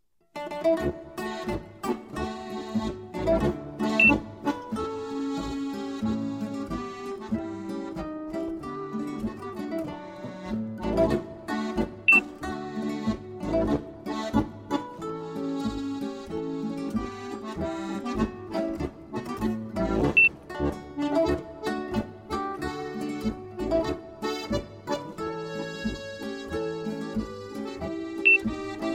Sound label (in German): Boarischer